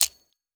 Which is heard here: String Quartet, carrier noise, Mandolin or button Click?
button Click